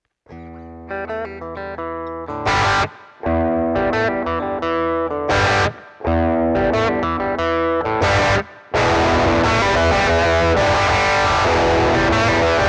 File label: dyna amp reverb; cube 60